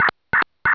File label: mobile call